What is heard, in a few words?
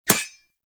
Rising Blades Sword Impact; Warframe; Pax Duviricus